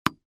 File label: Sports Ball Bounce Single Tennis Ball